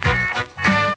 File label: horns duh duh duhhh